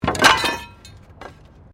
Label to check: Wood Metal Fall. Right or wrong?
Right